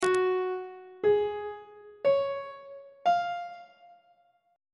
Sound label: f sharp major 7th suspended 2nd chord intervals; on treble clef